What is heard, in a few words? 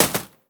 Hit; moul